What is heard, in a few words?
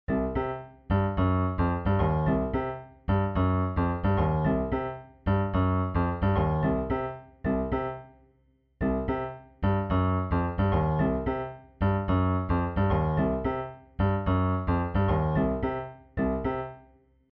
funk bassline; piano